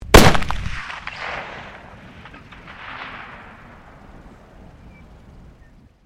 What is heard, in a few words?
RIFLE MAG-1 FIRING; Rifles, non military; Rifle Sound Effects; Rifles (Sound Effects:Guns)